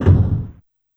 Door Slam, Yamaha PSR-E323 SFX Kit

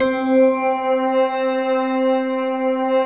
VFX BELL, Synthesizers

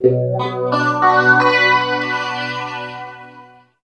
GTR; East West; Phat & Phunky

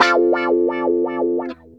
GUITAR LICK; Ueberschall; Jam Box

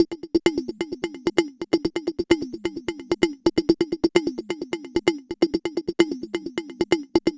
Percussion Loop, Synth Percussion; Sonic Foundry (Sony Creative Software); Dr. Fink's Funk Factory

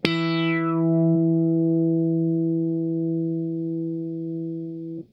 NOTE E3, Funk Guitar; 90 s Sample CDs; Zero G

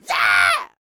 YELL, MALE HUMAN; Spectrasonics Vocal Planet

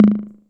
Tom Delay, TR-606 Bent Samples

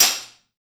trash stix; Spectrasonics; Liquid Grooves 1 & 2; METALS

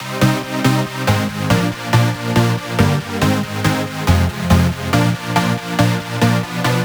140BPM C; Vengeance - Essential Clubsounds Vol.4

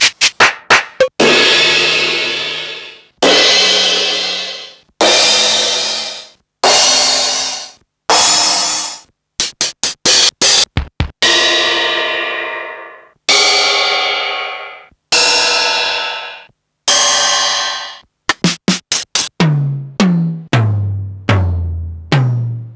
Ensoniq Mirage

